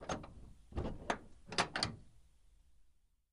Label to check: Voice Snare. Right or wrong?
Wrong